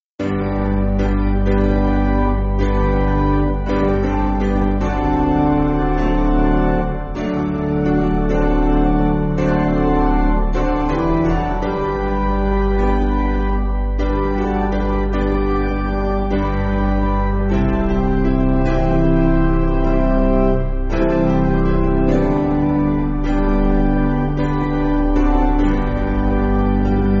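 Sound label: Piano, Music Backing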